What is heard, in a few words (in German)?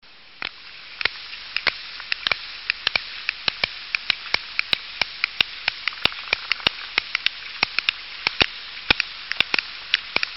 pottwal